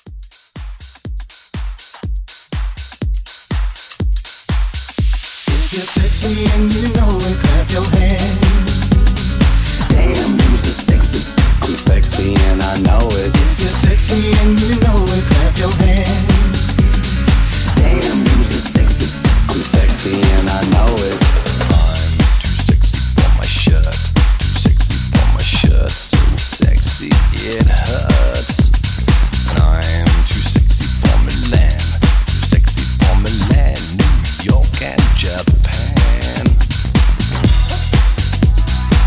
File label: 90s Hype Re Drum 122